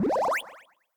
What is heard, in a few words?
UI Round Feedback Good; They'll Come from the Moon